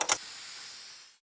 sand; shore

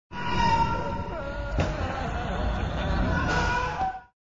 insanity sound; the necronomicon

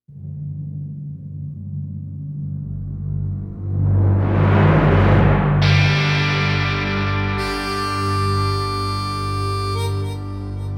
Jaibreak Music; NIS

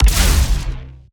Lasrgun Ballista Fire Long

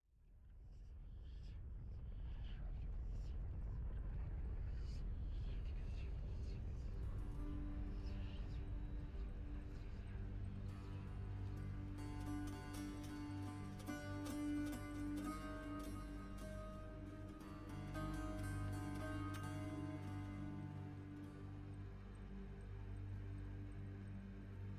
Music Exploration with Aura ambience at start

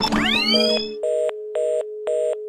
Checkpoint

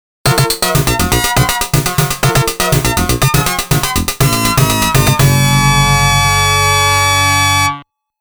Game Start; Toaplan Arcade Sound Digital Collection Vol.07

